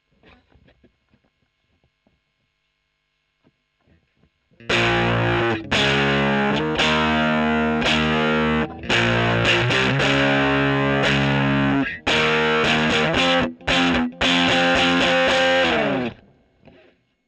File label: E.GTR Gefell